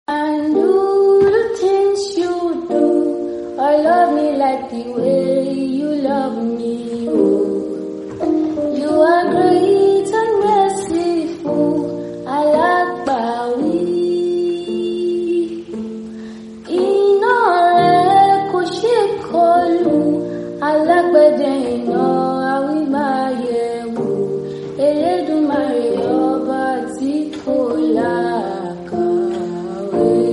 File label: Acoustic